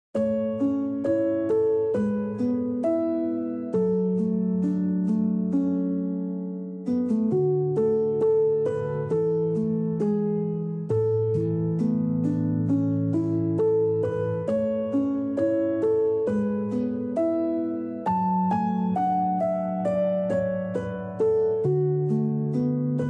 Touching; trickcal chibi go; android; anime

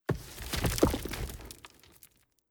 Hydra Stone

